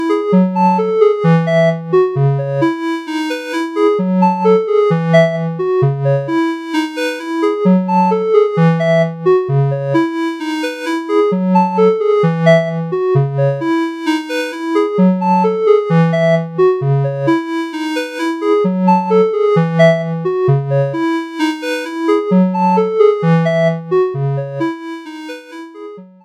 Modal Argon8

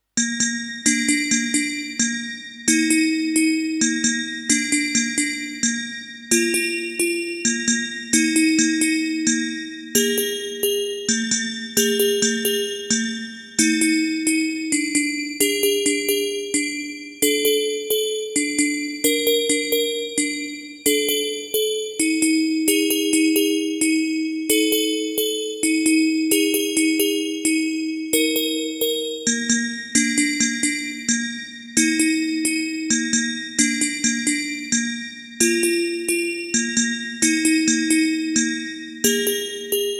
Proteus 3; Bali Colors

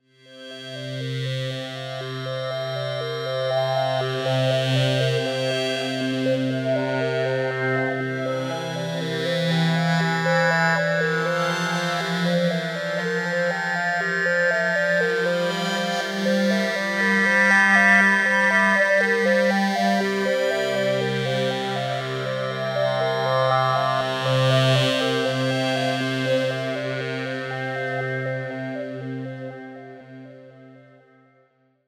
Hydrasynth mixology

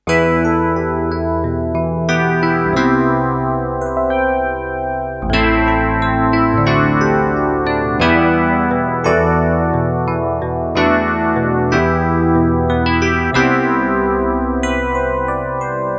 TX7 Rhodes

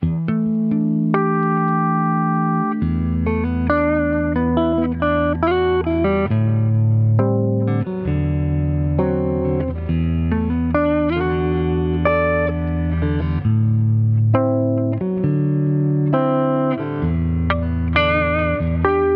clean P-90

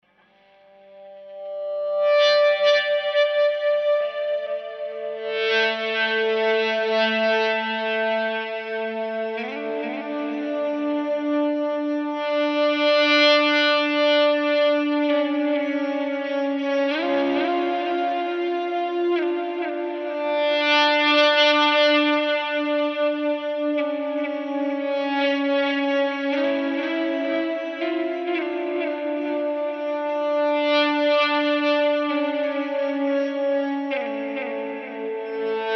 Aeon Delay Reverb

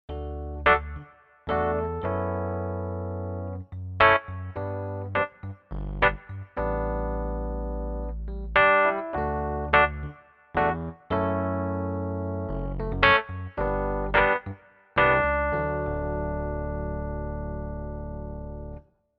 Roland RD 800 Dyno E Piano

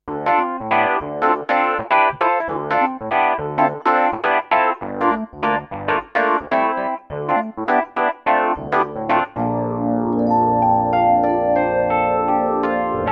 J7 Phaser EP

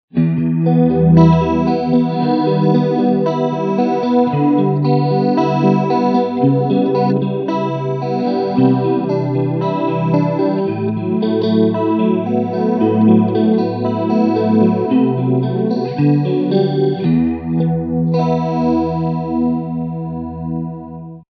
ADT Mode; Seafoam

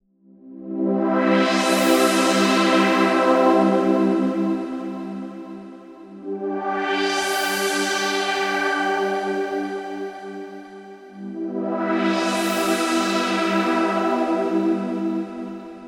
Softsynth Dry; EQP; SoftSynth4

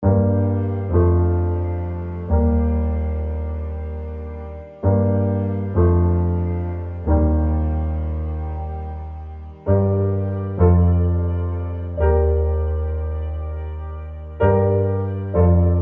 Broken Ambient Keys; ASCEND

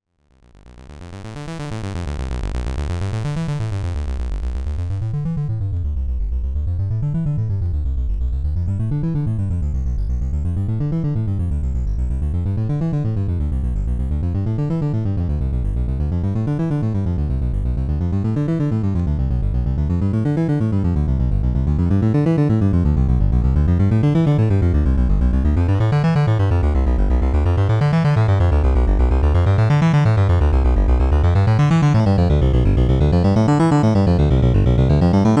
Sonicware ELZ 1 DNA Explorer Hang Drum

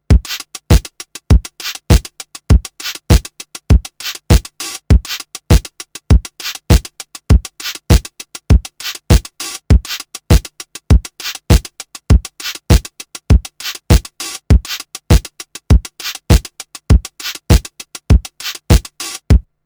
Drum Machine Process

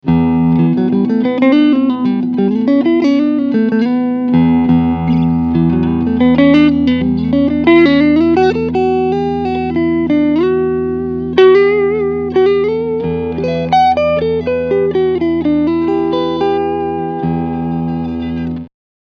Front SC Clean

